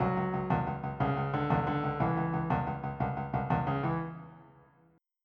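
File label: shuffle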